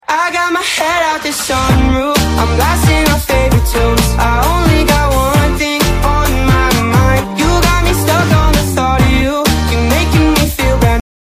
walk up music

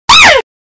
cute jumpscare

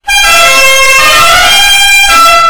honk honk honk

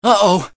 damage; mgs; otacon

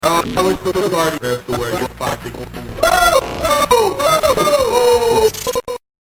5 big booms

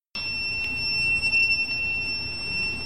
taser successful connection; taser 10

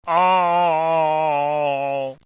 sad noises; baldi

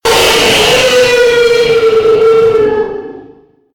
jumpscare sound; one night at dekart

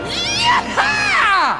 yeeeeahaaaa

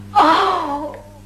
ooohhh

